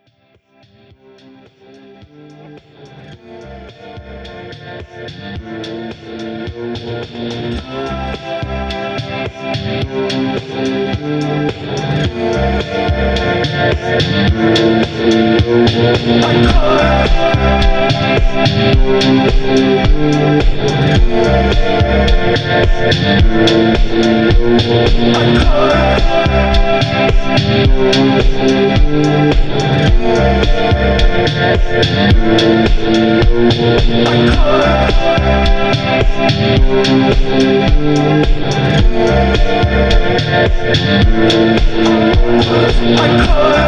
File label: Cry; Data Wing; mobile